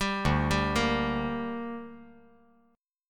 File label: D Suspended 4th Sharp 5th D down, chord